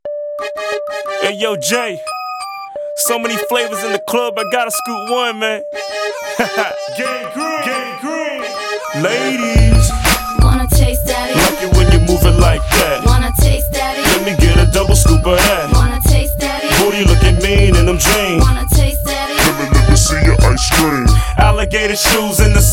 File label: hiphop